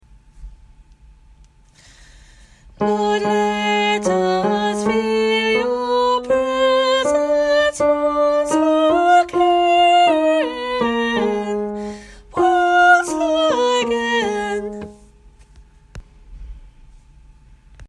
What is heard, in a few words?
tenor